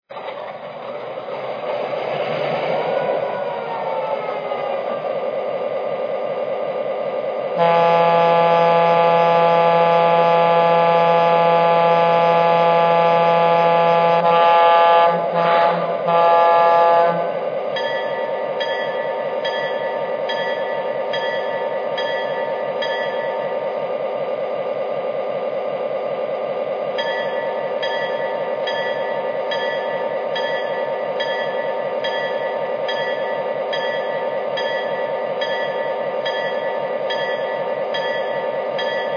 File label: f3, trains